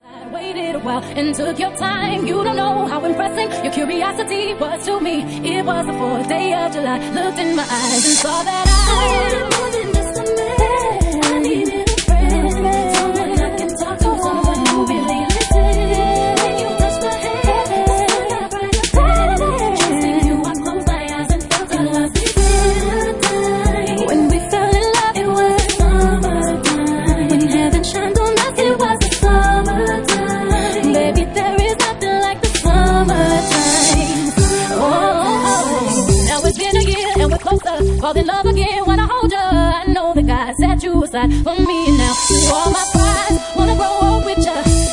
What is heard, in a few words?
Organ Remix